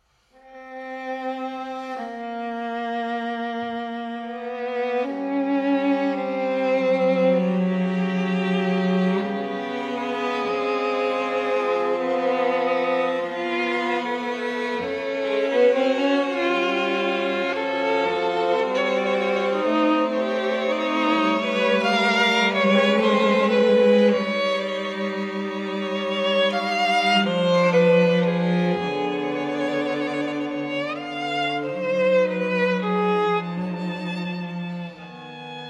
string quartet adagio e molto cantabile

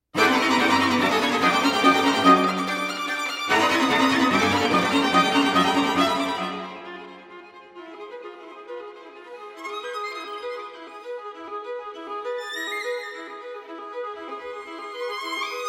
string quartet

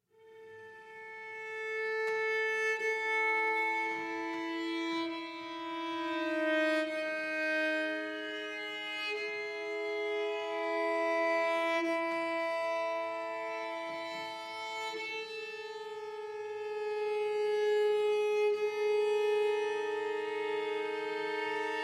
string quartet; III. Space Travel

